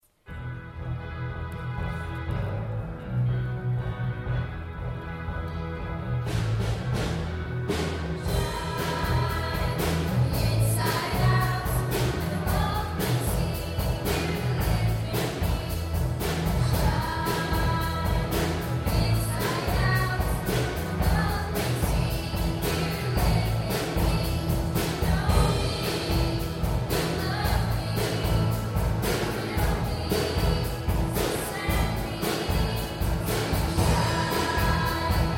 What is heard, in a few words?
music from st pauls church